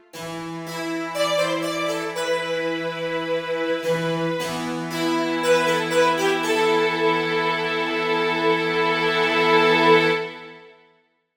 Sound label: Kurzweil PC4 Ensemble